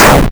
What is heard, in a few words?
Death explosion; Blastmorph Pinball; xbox series xs